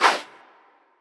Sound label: loaded frame disappear; Butterfly Lovers; wazdan